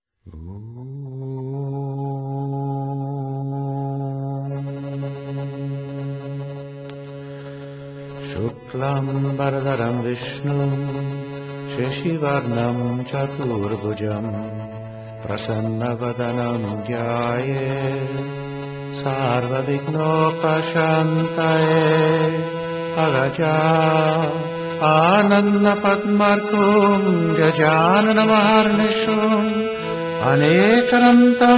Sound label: opening chant, Bhajan; Bhakti